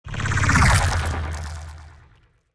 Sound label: pro ship strikecraft move; biogenesis